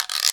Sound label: Guiro